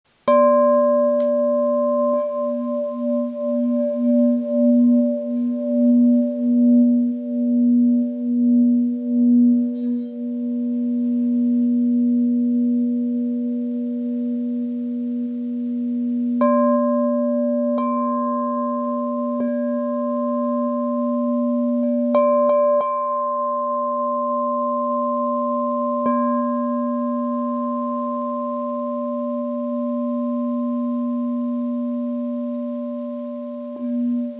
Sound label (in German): Hörprobe Zero 30cm B